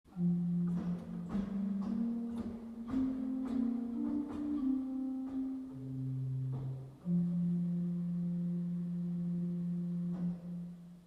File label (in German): Praestant 4